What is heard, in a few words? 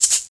Shaker Egg Shaker; Total Cult